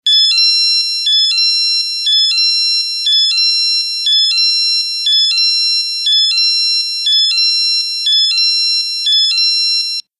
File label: CLOCKS ALARM CLOCK: INT: Digital alarm clock, two tone.